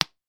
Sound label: toy spring suction jumping figure pop release; zapsplat